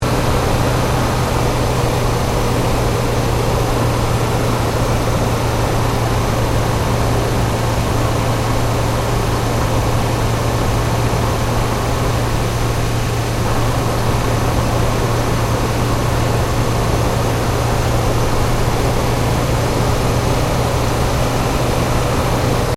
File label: Car Ford Focus Cruise Engine Bay; Ford Focus hire car; Cars; Vehicles